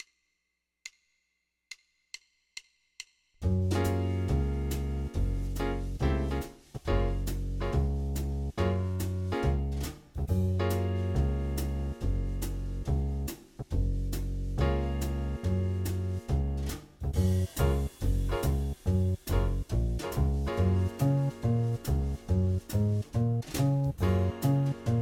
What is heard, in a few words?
Fmaj7 1 Chord Groove; guitar; guitaressentials; guitar lessons